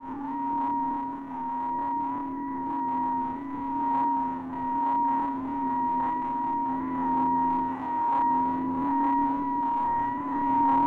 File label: Upright Piano Drone Tension; MatiasMacSD; PIANO ZONE ATMOSPHERIC ELEMENTS